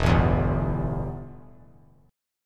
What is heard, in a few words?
E Minor Double Flat 5th E; strummed; JGuitar